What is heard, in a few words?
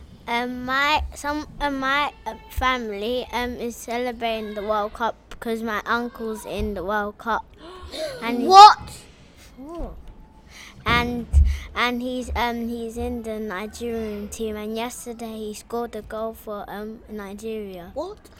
christian family background